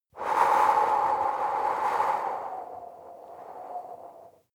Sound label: breath female exhale long wind